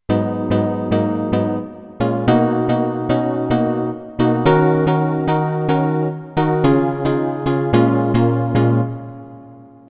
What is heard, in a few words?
rhodes, roland mt 32